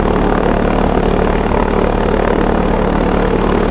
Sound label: fan blade idle loop, airboat; XDM; vehicles